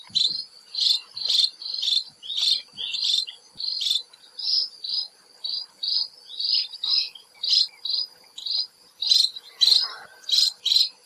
White-rumped Munia calls, Ipoh Perak Malaysia

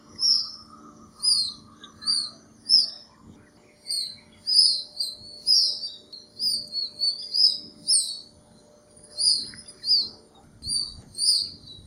House Swift calls, Ipoh Perak Malaysia

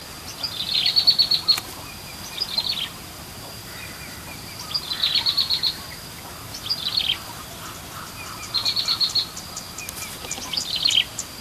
Yellow bellied Prinia adult calls; Amar

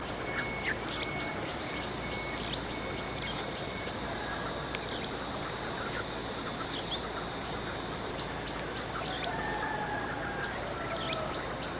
Pacific Swallow calls; Amar

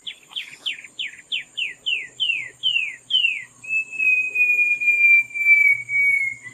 Short Tailed Babbler

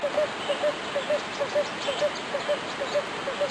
Gold Whiskered Barbet call; Amar

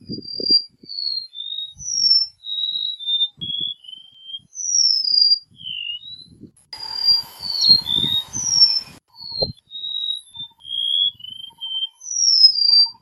Golden-Bellied Greygone calls; Ipoh Perak Malaysia

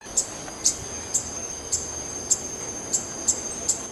Flowerpecker Orange bellied feeding calls; Amar

